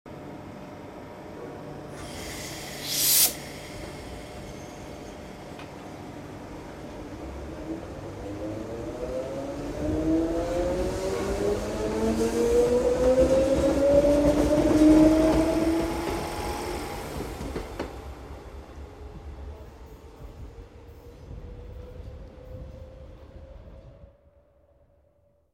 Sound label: Monorail Leaves